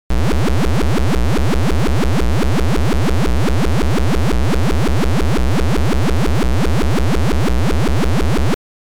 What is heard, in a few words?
Engine Sound; Oretachi Game Center Zoku; Scramble